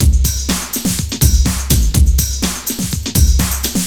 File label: LOOP 124